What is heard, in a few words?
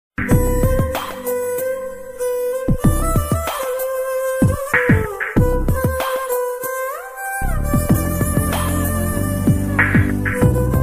Hindi Real Music Ringtone; ringtones